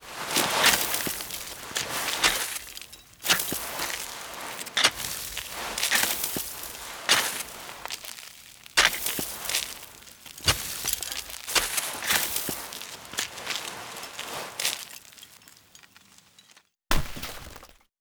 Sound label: bigjump; cliff; price; Call of Duty Modern Warfare